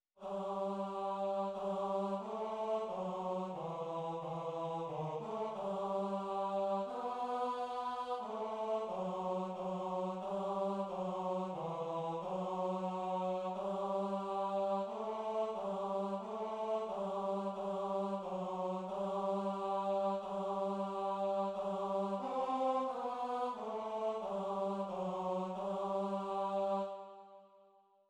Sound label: tenor; zborove skladby